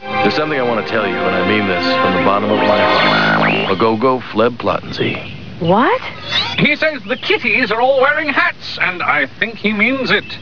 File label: Agogo; Flebplatsi